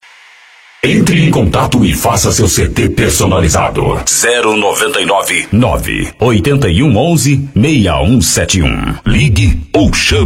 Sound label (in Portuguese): Paredao Ao Vivo